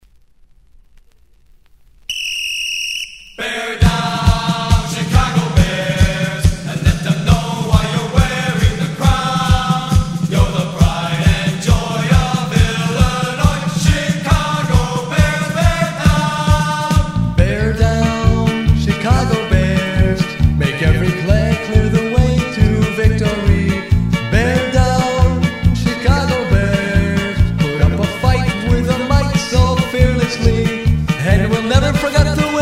Fight Song Vocal

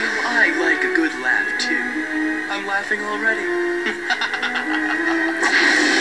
good laugh

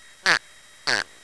fart

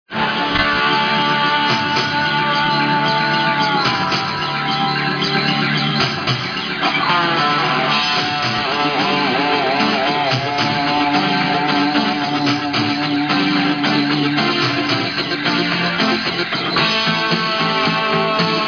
Waterfront Beat closing

